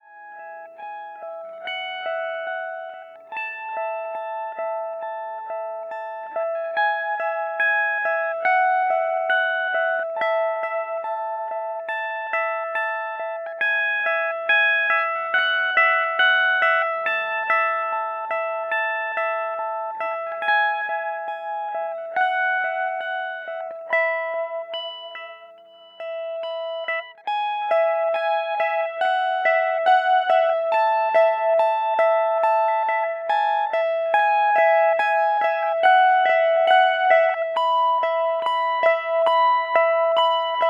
Dual Guitar; Precedence

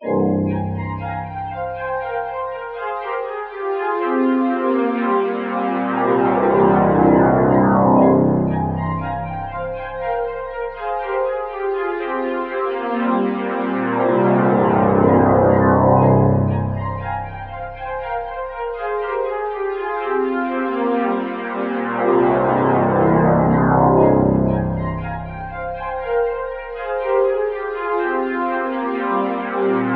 Cinematic Tension Builder

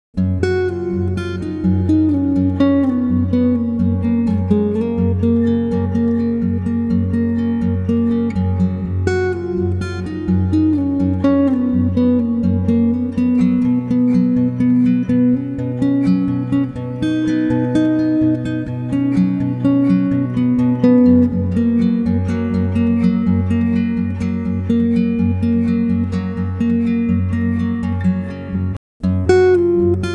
Guitar Wet Dry

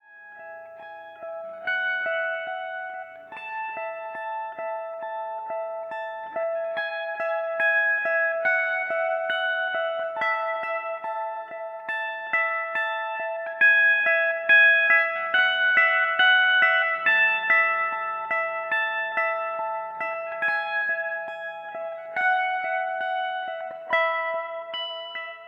Dual Guitar; Precedence Breeze2

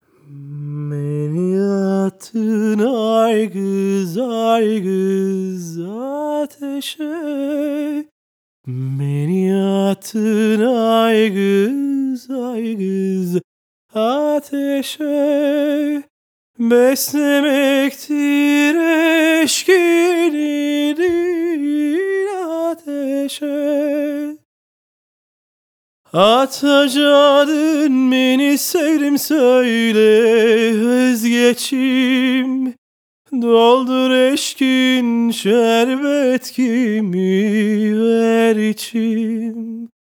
Male Vocal Dry; Precedence

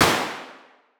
Drum Chamber IR; Breeze2

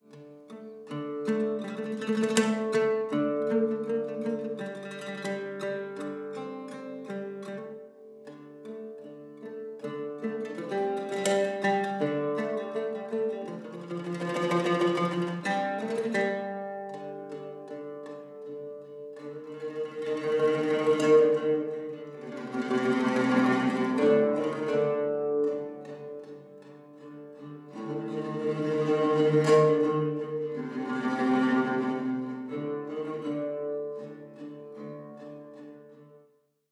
Oud; 4 Positions; Precedence Breeze2

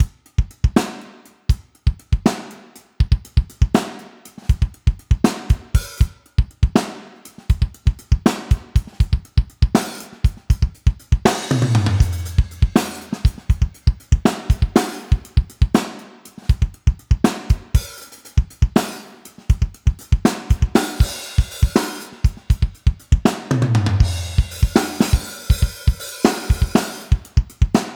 Drum Mix; Precedence Breeze2

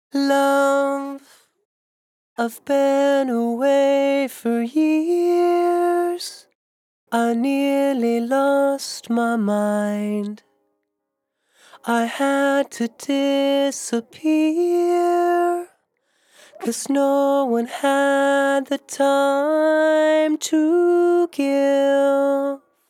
Vocal Dry; Precedence

